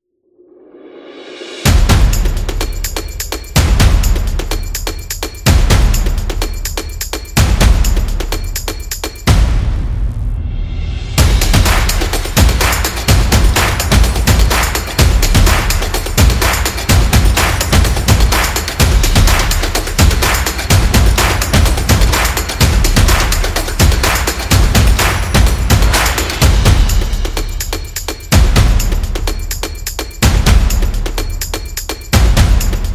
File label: Action Clap and Drum